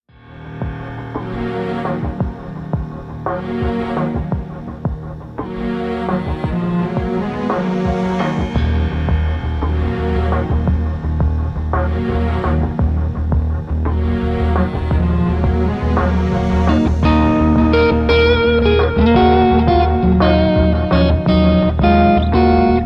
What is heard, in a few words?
Instrumental